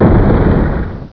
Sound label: STRIKE